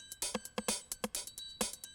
130, Classic DrumLoops

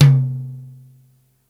tom, Yamaha RY-8

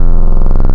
Rectifier, wavetables; Animoog

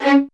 LDVLN1 ATT C, LOUD VIOLINS; Q Up Arts; Denny Jaeger Master Violin Library

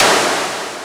snr; Mono

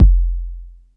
BD, Drum Machines; 300 Drum Machines; E-MU Orbit-3

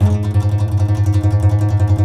C. Bass Balalaika, Plucked Strings

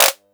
vermona drm1 mk3 clap

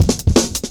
Jazz Shuffle Cut, Drum Loops; Jungle; 166.5; Zero-G Jungle Warfare 1, 2 & 3; 90 s Sample CDs

